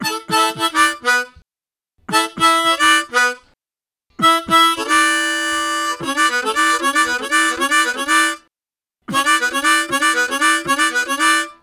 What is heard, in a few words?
Octaving, Harmonica Riffs; Zero G - Harmonica